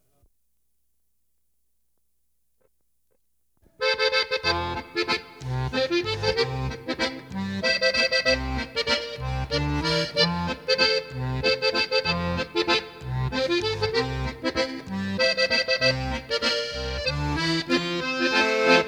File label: Kirmess Laendler, Concertina; Sauk Prairie; CUCA MUSIC